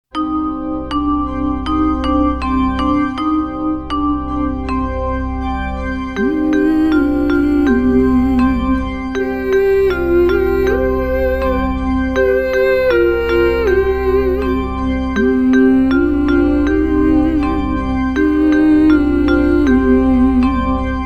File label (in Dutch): humming round met zang